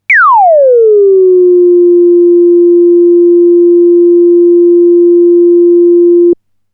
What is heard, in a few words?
Self-Osc VCF